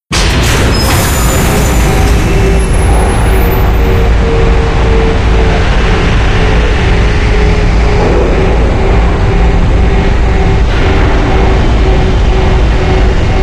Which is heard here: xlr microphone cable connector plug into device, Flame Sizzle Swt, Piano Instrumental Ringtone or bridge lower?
bridge lower